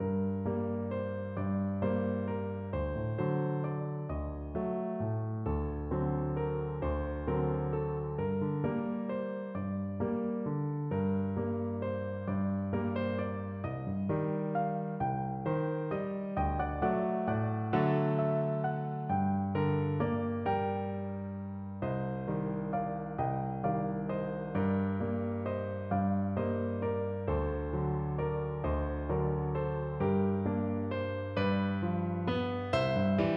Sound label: waltz, piano